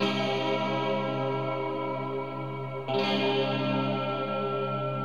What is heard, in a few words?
keys, Loops, Sad